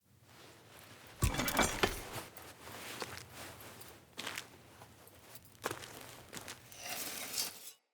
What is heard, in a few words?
Blacksmith Table Idle To Poke Idle; Blacksmithing; Friendly; Tuvalkane